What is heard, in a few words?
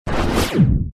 Sfx Enemy Knight Teleport; Mighty Knight 2